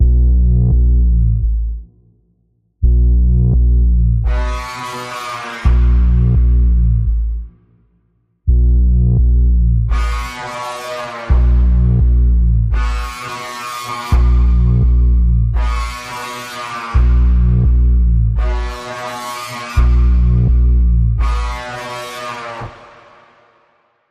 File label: Insidious Pulse Bends B, Key of B